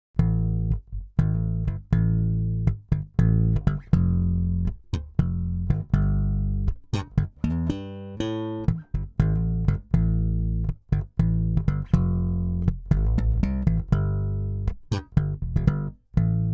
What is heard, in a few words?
Instrumental, Bass guitar